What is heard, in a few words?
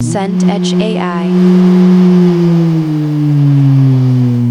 wind chimney moan